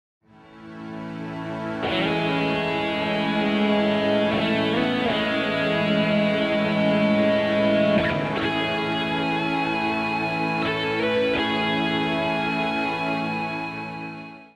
a major maj7; fretjam

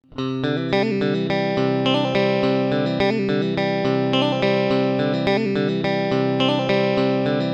chord phrase; fretjam

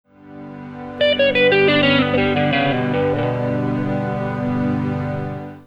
maj aug4 arp; fretjam

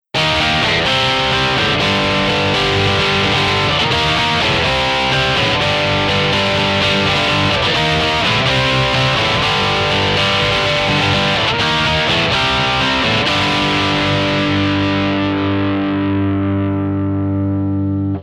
powerchord key; fretjam

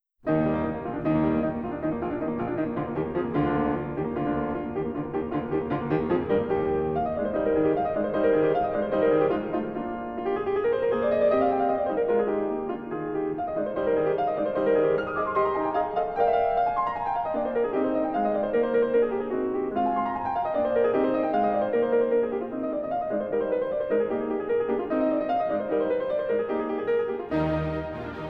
piano concerto presto; mozart

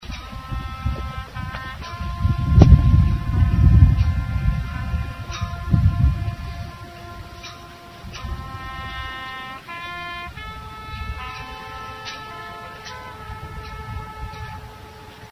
Taps

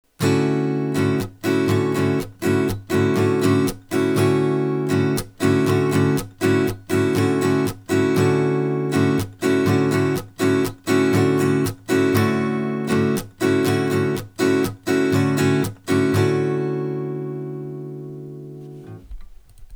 strum mute; fretjam

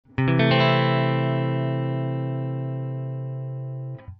cadd6 open; fretjam

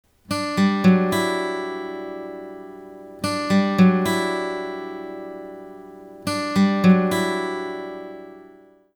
chord pick; fretjam

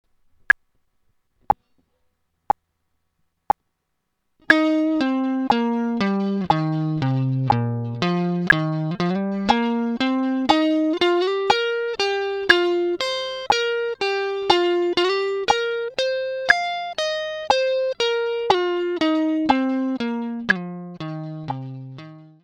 pentatonic change; fretjam

